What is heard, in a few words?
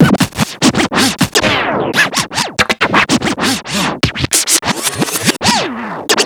Yamaha Motif ES-Series scratch